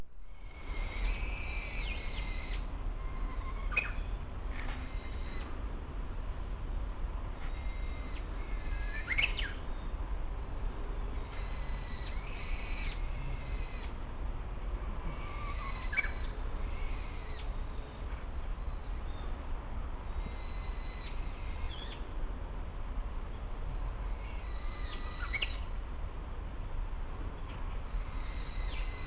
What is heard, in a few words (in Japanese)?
UGUISU